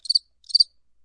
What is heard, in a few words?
cricket